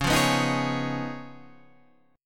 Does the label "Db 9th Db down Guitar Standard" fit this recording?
Yes